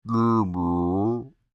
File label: Creature Speak Groan Med, Human; Groaning; BigRoomSound